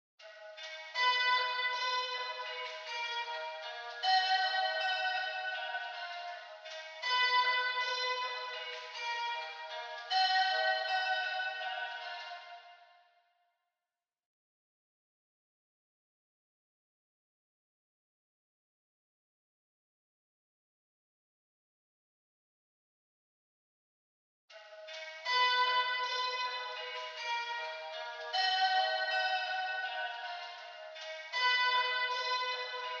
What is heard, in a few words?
158 BPM D# Min Airy Lead; Loop Stems; Cymatics; Fill Up My Mind